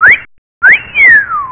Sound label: Whistle